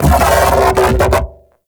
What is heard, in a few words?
Mech Ambient Vocal